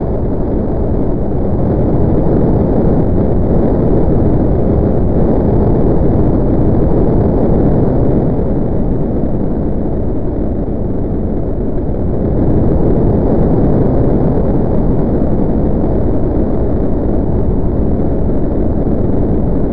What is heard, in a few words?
ocean; Focus Comatose v4.2.2